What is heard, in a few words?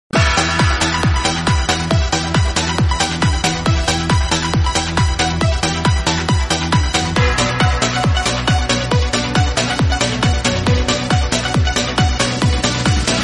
trance ringtone; bollywood ringtones